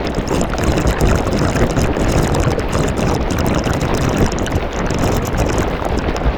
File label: Goop Waterfall; Nicktoons Nitro; arcade